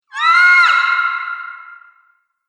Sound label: Female Monster Scream She-devil; Monster Sound Effects